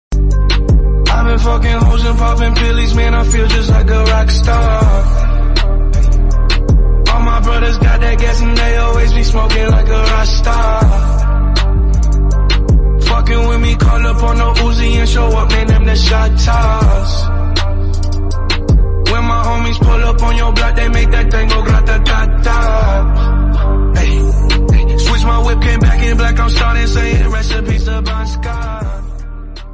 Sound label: English ringtone